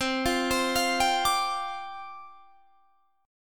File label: G 7th Suspended 4th C